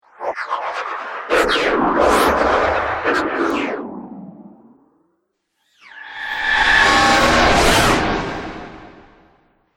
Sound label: Dubstep Scifi Effects